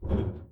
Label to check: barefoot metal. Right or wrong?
Right